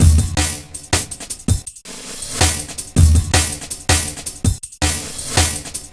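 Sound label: 162 Stretched, breaks; M8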